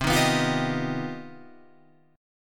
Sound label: C Minor Major 11th, Guitar, Open F; CmM11 strummed; JGuitar